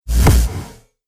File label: Cinematic Hit, Trailer Sound Effects